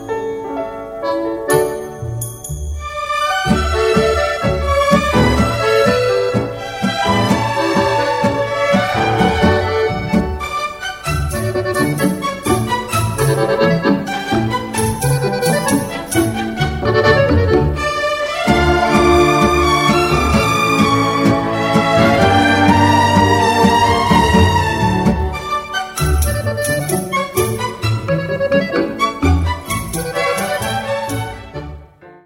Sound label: Tango